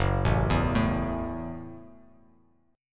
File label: F sharp Minor 7th F sharp, Bass Standard; arpeggiated; JGuitar